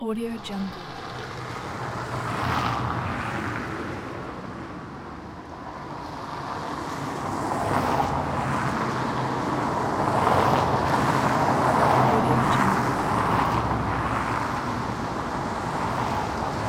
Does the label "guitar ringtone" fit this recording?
No